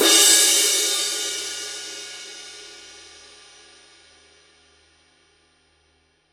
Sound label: rude 20 thin crash edge, paiste